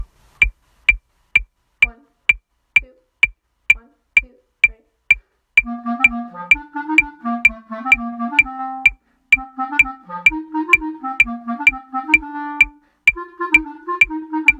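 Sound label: bass clarinet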